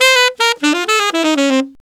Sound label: Tenor in F, Tenor Sax Loops; Sonic Foundry (Sony Creative Software); Jazz Solos and Sections